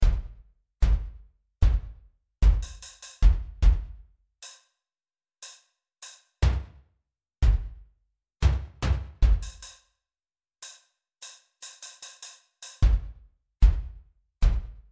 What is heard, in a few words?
drums